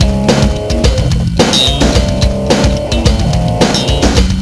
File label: Rythm Loop; QuickEditor 3.6